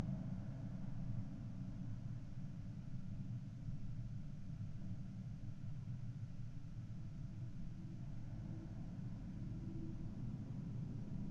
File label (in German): Langzeit 25mm Radiator